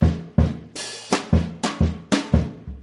other fuels, Drum loops Boombap

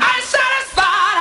SUNG

